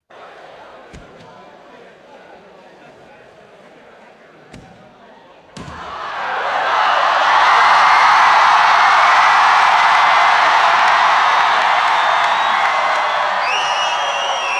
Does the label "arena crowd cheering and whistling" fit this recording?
Yes